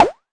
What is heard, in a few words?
Arturia MiniBrute sn